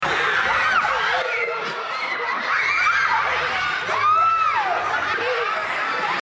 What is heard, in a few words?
vocals